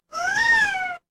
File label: animal cat meow